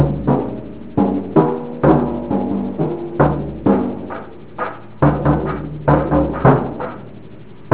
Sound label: drum; kids